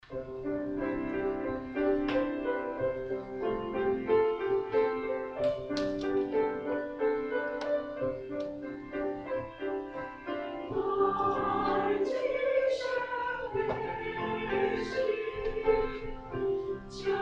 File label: Choir; taiwanese